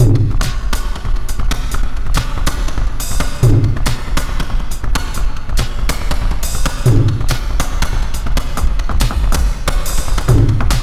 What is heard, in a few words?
beat 140